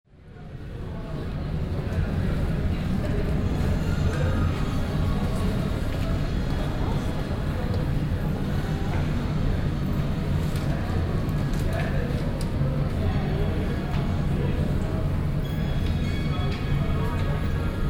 ubahnstation kitschmusik

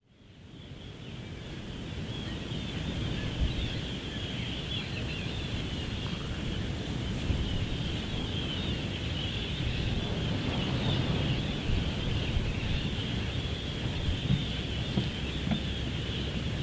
flatey voegel

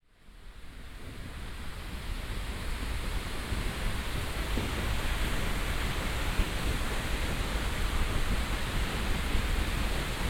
wasserfall seljalandsfoss

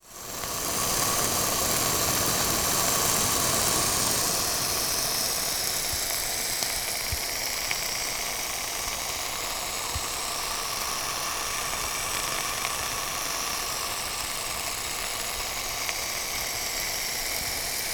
singende polenta

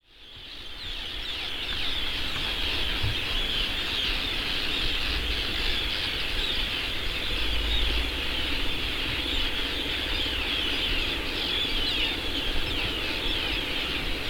arnarstapi voegel

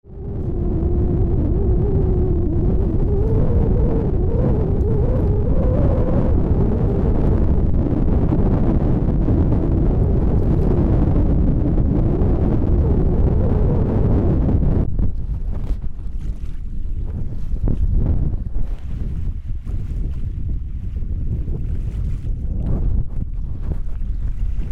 meer

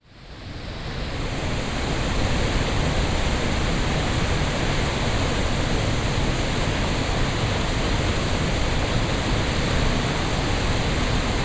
wasserfall oexararfoss nahe kaputze

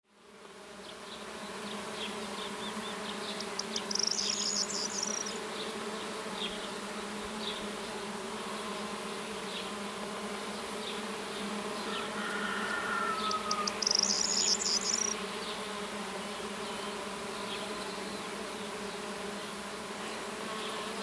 phaselia bienen

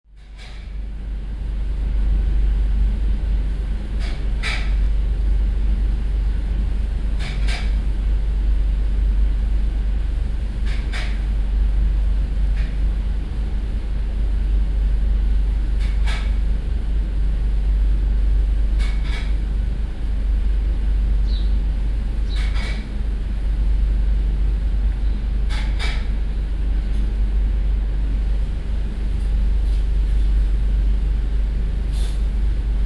zimmer ausblick kuechengeraeusche

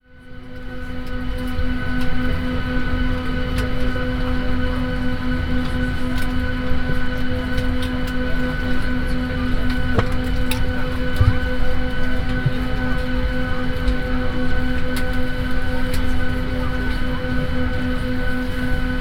flugzeug prestart

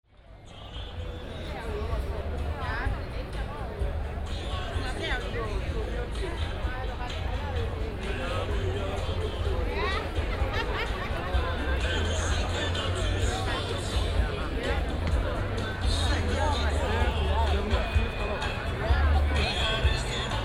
park bier menschen

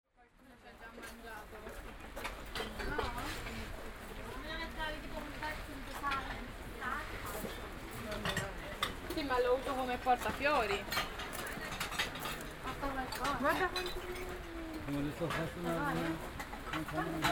mauerpark geschirr

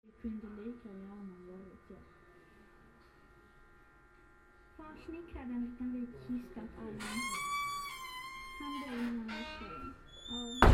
klo tuerquietsch

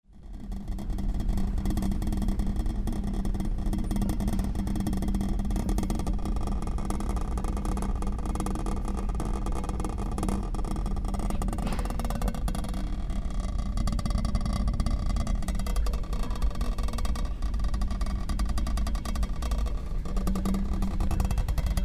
schild scheppern durchsage; se-durchsage

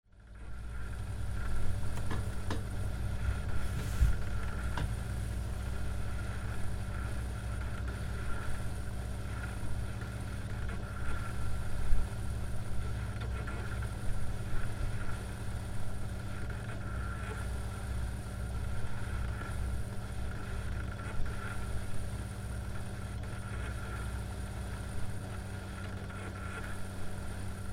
kuhlschrank

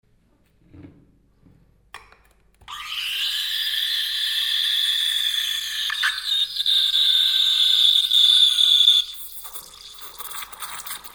cafemaschine

